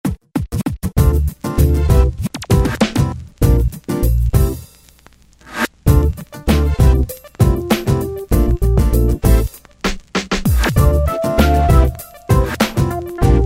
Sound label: Synth, Yamaha PSR-3000; Factory Demos